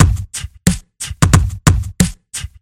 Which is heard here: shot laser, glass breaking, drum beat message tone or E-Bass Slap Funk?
drum beat message tone